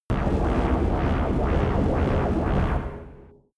space inside biomedical alien spaceship windy active